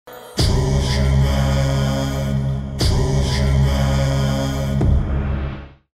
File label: gong